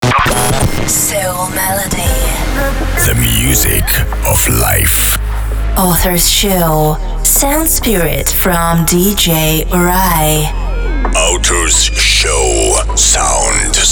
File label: radio intro